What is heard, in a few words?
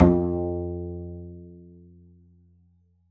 pizz f2, double bass